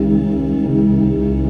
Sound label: VOICEFX ETHNO 160; eJay